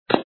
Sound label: Land; Tails Nightmare 2